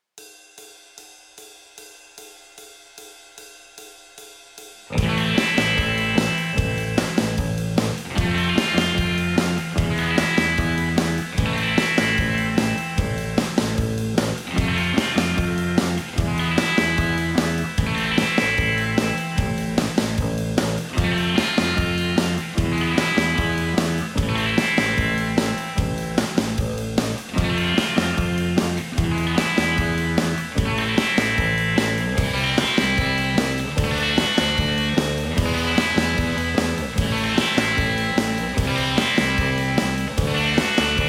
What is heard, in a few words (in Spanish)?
Acompañamiento Surf